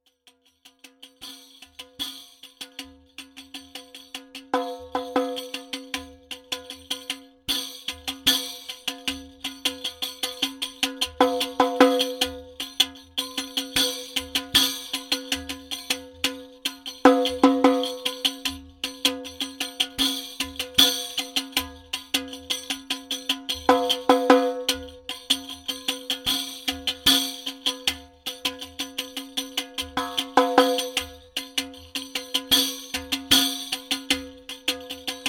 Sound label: Healing Beat